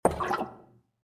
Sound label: beep